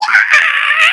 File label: hiyaaaaaaaaaaaaaaaa